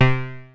OB C4 -R, Rare Synthesizer; Hollywood Edge - Giorgio Moroder Rare Synthesizer Collection; OB 4 VOX.

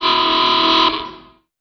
Klaxon, cartoon Foley Fx; musicradar; cartoon caper

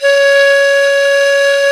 FLT, Sicuri Pipe

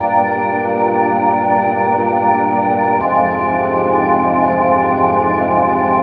170, Bb, Keyboards; Total Drum&Bass; 90 s Sample CDs

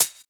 Hhat, HiHat; Equipped Music; Loungin House

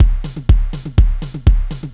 Percussion; Sonic Foundry; Rondo Brothers Trip-Hop Loops & Samples

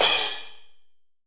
CRS MUTE, CYMBAL

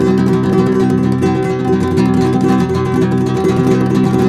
C# MJ, Guitars; Spectrasonics; Hans Zimmer Guitars VOL-2; FLAM TREM CH

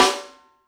Snare Rim, YAMAHA RM1X

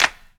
CLAPS HIP, CLP; Best Service Dance Mega Drums

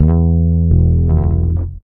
REAL BASS, BASSLICKS; Ueberschall; Jam Box

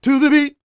SING, Vocal Planet R&B & Dance; ILIO

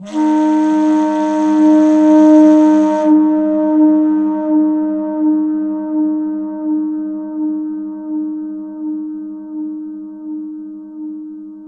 Flute Effect, effected flute

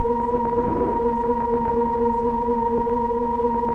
keys Optigan; Roland LCDP09 Keys of the 60s and 70s 1

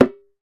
Dhol; Roland BK-5; Percussion; Dholak

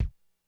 Kick, Korg DSS-1 Drums

